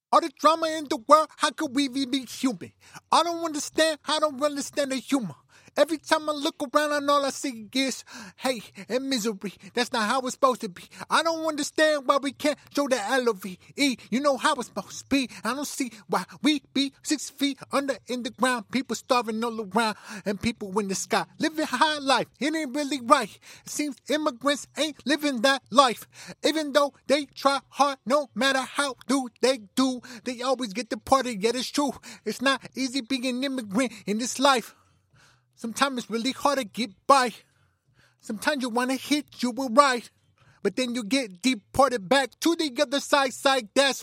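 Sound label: hip hop 90 C sharp m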